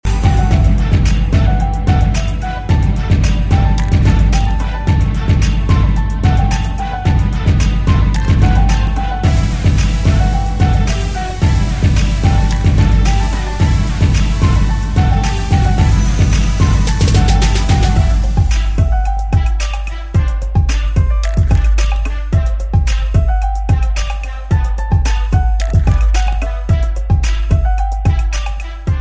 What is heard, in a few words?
Club Type